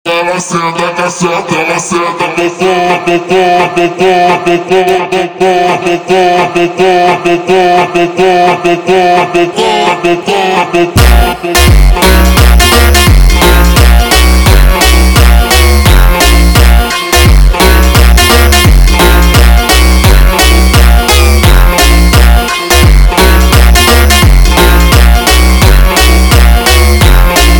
Montagem Slowed

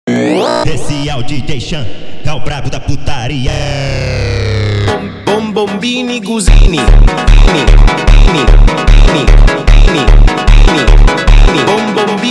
funk

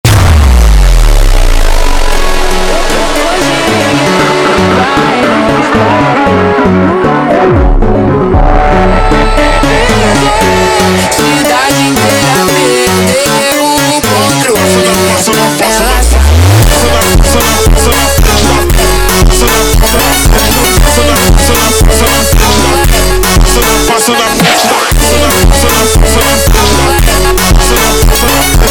Montagem Slowed

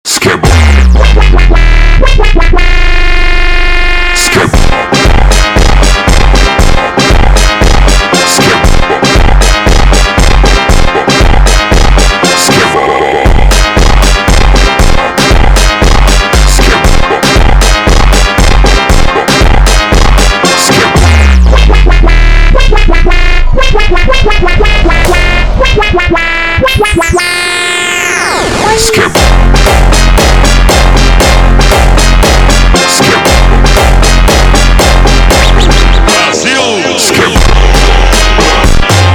Funk slowed

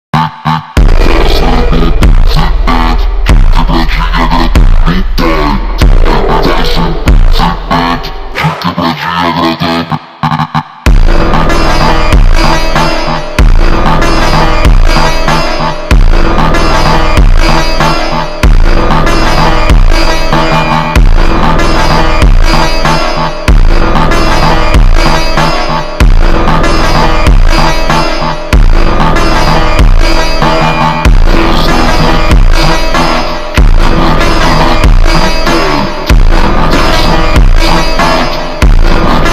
Phonk Slowed

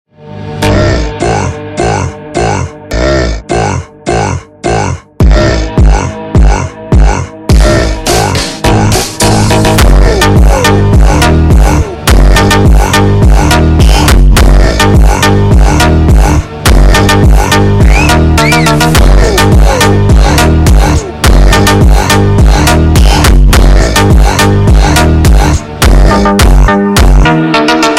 Funk super Slowed

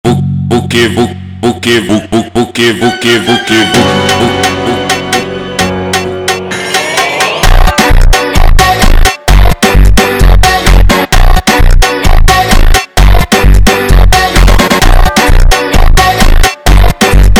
Funk

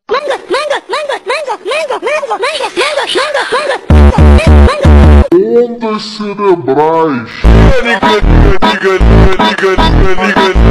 MONTAGEM FUNK (Slowed + Reverb) slowed

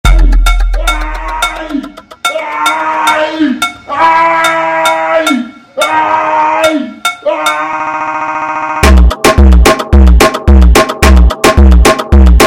Beat Pinocada slowed

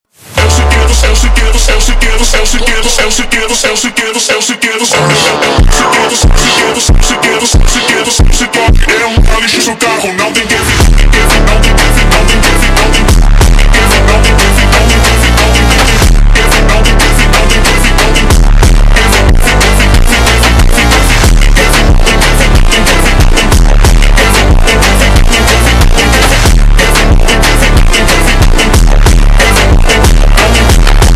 Funk super Slowed

